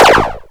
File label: laser gun